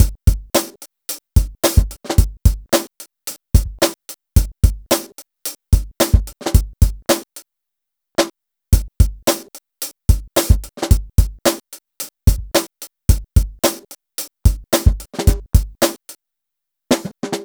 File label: Drum Kit, Electro Lounge; Sonic Foundry (Sony Creative Software); 110 Strictly Legit